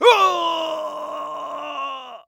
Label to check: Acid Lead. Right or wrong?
Wrong